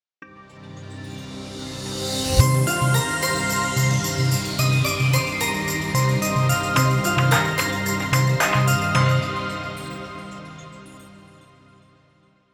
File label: Chilling Logo